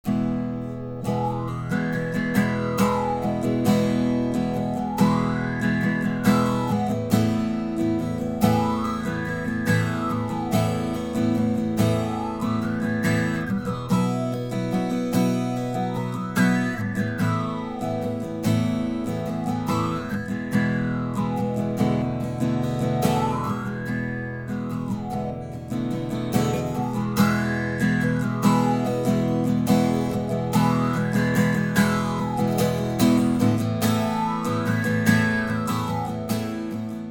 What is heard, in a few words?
phaser; audio examples from the book